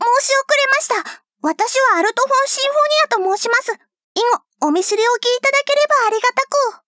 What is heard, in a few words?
alto